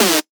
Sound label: synth screech oneshot D dry; Synth Hits; GhostHack